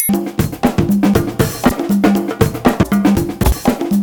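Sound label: TRIAN, triangle, Modern Percussion Loops; Soundscan vol.56; AKAI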